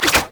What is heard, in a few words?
grasping roots; LoL; zyra